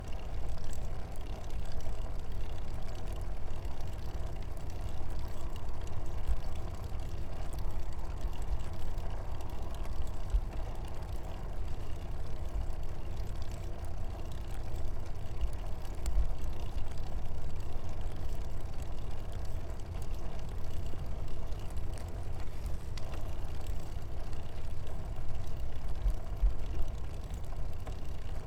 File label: Biking Padelling